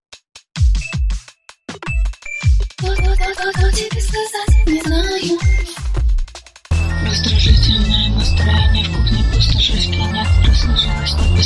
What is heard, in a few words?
Samsung Ringtone Remix